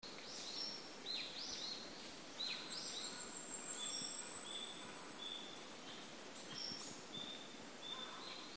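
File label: yabusame, Asian stubtail; bird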